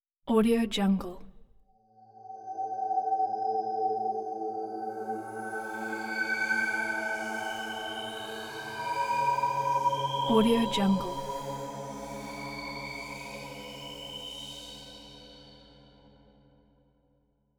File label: mystic choir drone